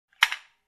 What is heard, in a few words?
Construction Gear